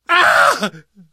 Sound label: death scream, survivor